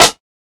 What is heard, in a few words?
small reverb steel snare drum sample e key